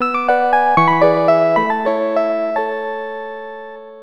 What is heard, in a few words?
Nokia Tune; Ringtones